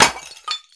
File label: smash